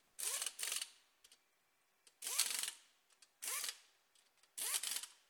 Wheel nut air gun

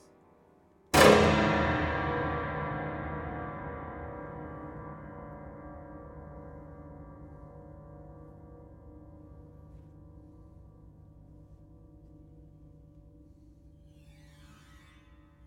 Big Stab

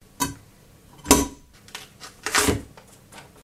Letterbox With Post

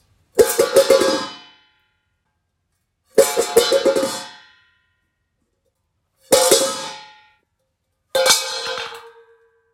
Metal Dog Bowl Falling

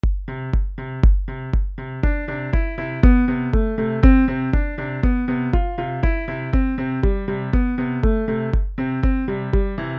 Could Be An Outro

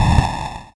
Short Explosion

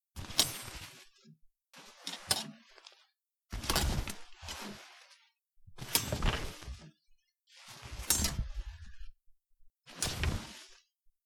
Coat hanger Rustling

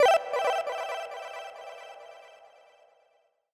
Blip

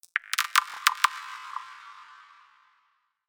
Electrodrip Tone D5

